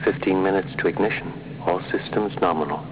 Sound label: ignition; Fifteen minutes to ignition, all systems nominal.; 2001: A Space Odyssey; HAL